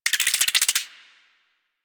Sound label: Tiny Creatures; Ambience; Warframe; Corpus Railjack